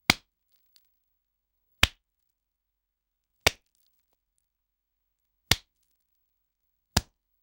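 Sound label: wet flesh on flesh slapping, gore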